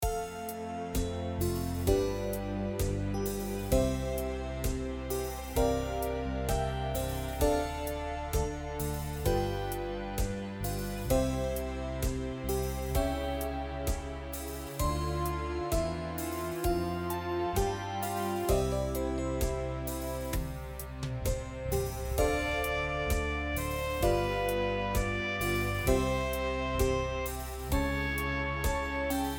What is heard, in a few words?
Morning Song Underscore